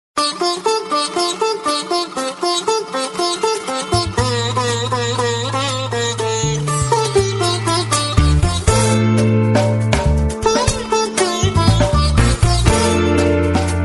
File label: sitar music tone; galaxy